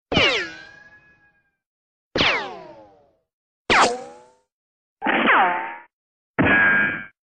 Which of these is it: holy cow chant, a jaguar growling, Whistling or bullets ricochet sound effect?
bullets ricochet sound effect